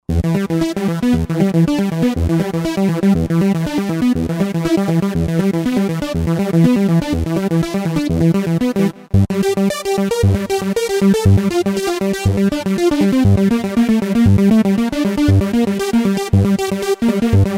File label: Sequalog, Roland JV-2080